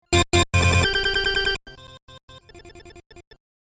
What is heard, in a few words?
tones; FM